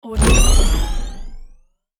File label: boost